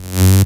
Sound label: Synths F#; Vengeance Samples